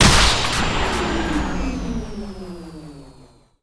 Ball Hit Stagelast

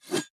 Swing Spear Short; Swing Spear Short Second

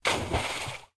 Water Splash; Aporkalypse Pigs of Doom

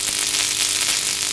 Electro Buz

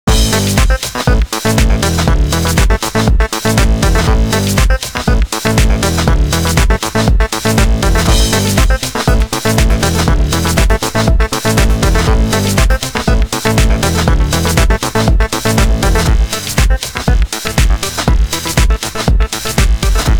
Stage Clear; Stage Clear (Score Screen)

